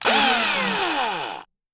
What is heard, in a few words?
electric driller spin